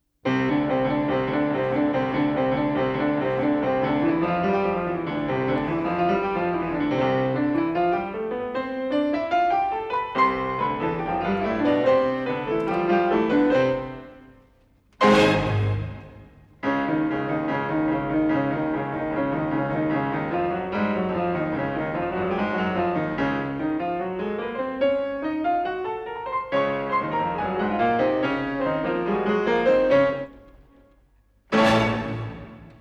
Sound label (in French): Pianistes; le carnaval